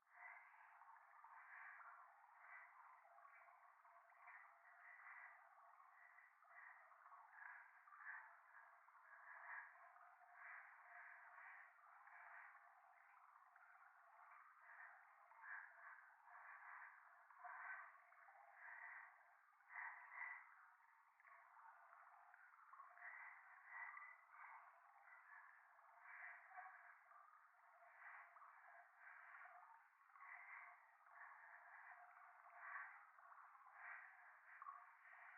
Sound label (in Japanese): 右耳 快感 吐息 川のせせらぎ; 夢の中